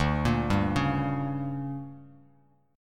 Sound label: D Major 7th Suspended 4th Sharp 5th, Bass Standard; DM7sus4#5; arpeggiated; JGuitar